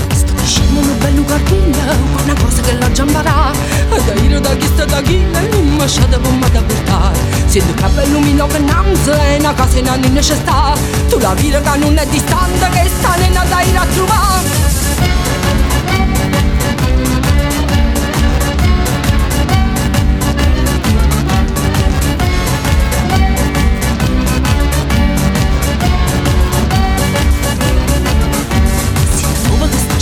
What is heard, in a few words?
remix tarantella